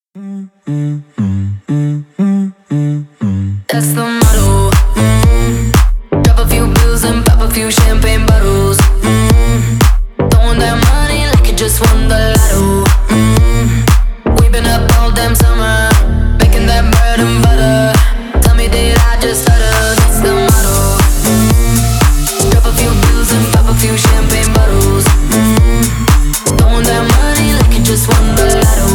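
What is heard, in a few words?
club hit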